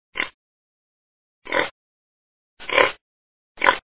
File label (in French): Cochon